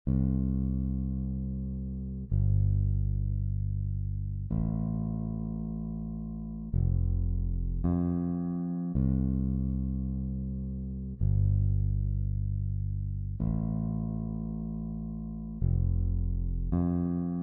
Bass Guitar, Audition Track; 2025 MMEA All-State Collective

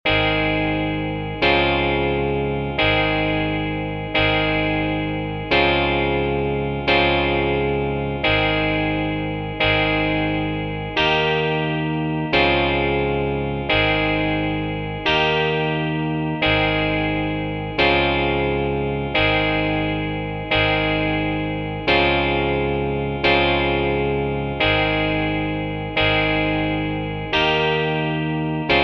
6 8 Blues No Drums; Tryout track; 2027 MMEA conference All-State Collective Ensemble audition

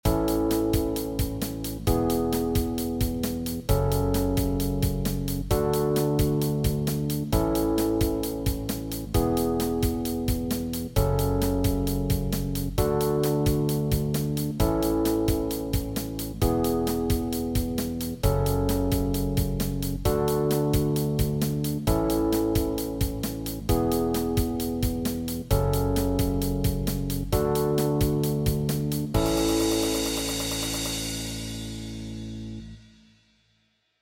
Fast Rock No Guitar; Tryout track; 2027 MMEA conference All-State Collective Ensemble audition

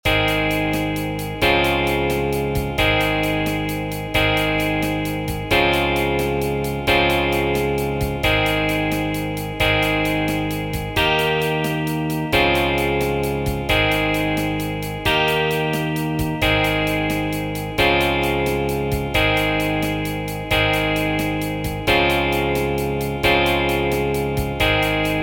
6 8 Blues; Tryout track; 2027 MMEA conference All-State Collective Ensemble audition

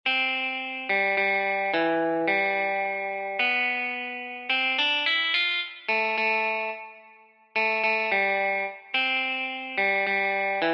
Electric Guitar, Audition Track; 2025 MMEA All-State Collective

